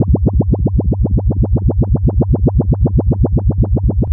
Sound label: Noise, LFO & Noise; Sonic Foundry (Sony Creative Software); Prototechno